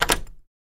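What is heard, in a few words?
Dead Bolt Unlock Quiet, lock & keys; Dead Bolt Unlocking Quick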